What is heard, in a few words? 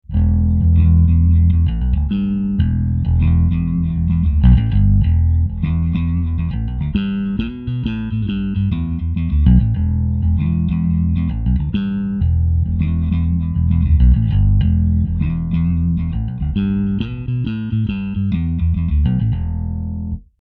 riff b15 cab